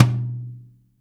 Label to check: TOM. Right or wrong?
Right